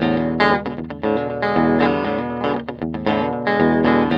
TELE 7TH F 2, guitar; Spectrasonics; Hans Zimmer Guitars VOL-1; TELE7THS

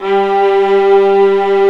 STR, Vlas Sect, Agitato

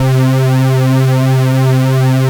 X TRA C4, X TRA SYN

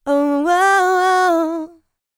LICKS, Vocal, R&B; ILIO; Dance; LT R+B

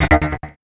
Fill, FX; Sonic Foundry (Sony Creative Software); Esoterik Beatz

